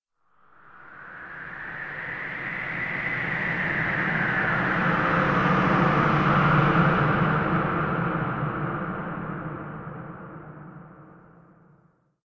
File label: Graveyard Wind; Scary Soundscape Sound Effects